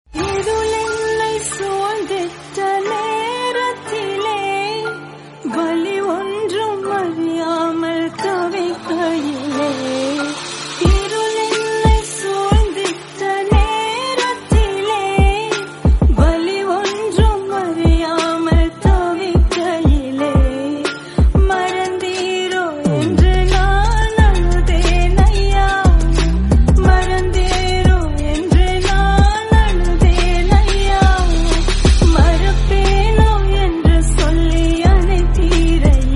tamil christian song

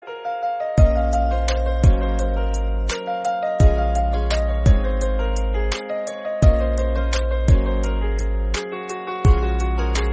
lofi instrumental

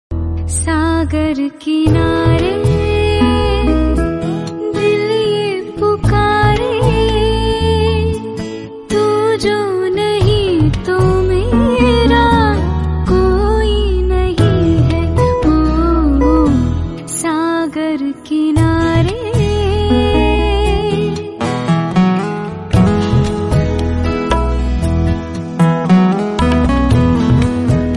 instrumental bgm

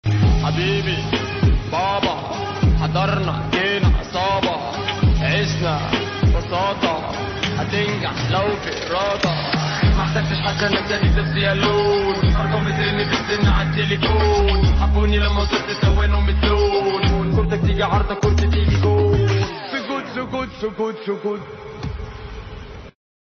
arabic song

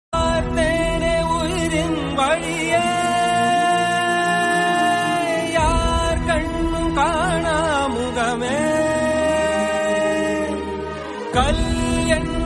tamil devotional; sivan; rudra

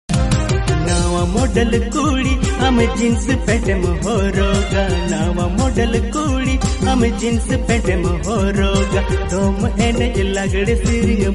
new santali

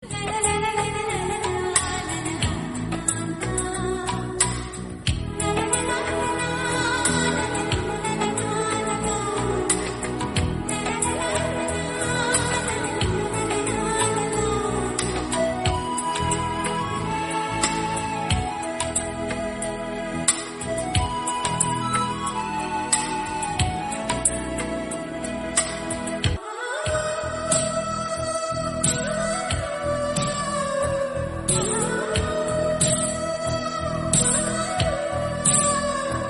background music

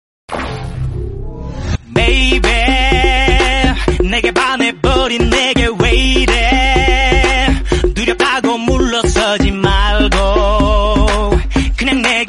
ding dong

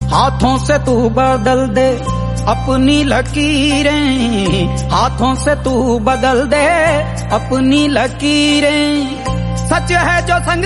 hindi; omg 2

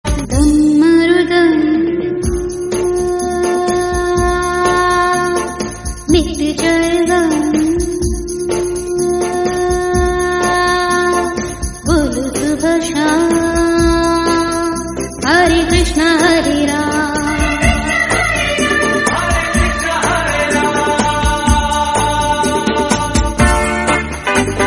old hindi song

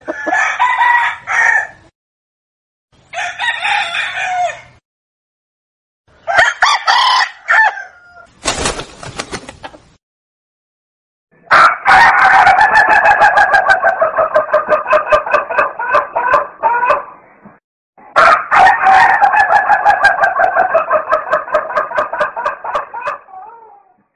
hen sound effect rooster sound hen sound effect

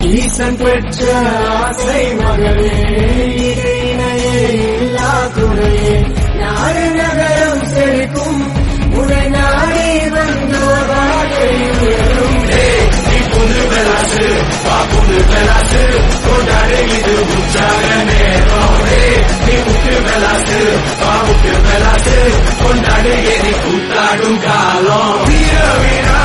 vinayakar song tamil

